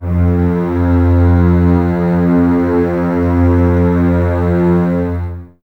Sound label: Basses F 2, f Full; String Ensembles; Miroslav Vitous; 90 s Sample CDs